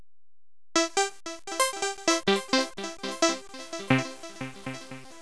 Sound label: echo